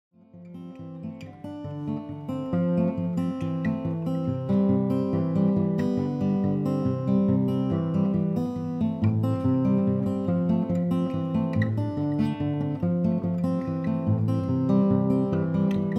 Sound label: nhemamusasa on acoustic guitar